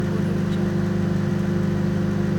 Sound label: old microwave loop